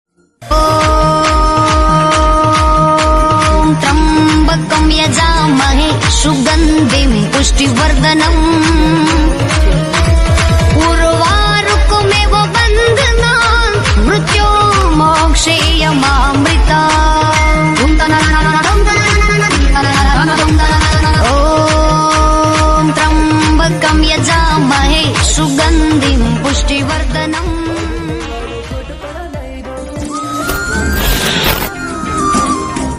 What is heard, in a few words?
instrumental; om tryambakam yajamahe